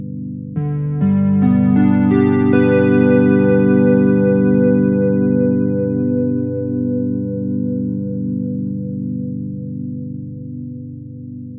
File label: Bells; jup8